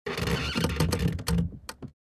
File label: Squeaky bed wood movement